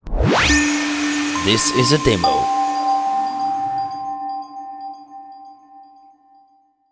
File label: Xmas door bell; Santas door bell